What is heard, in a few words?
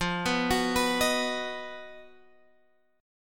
B Major F